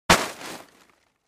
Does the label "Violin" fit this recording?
No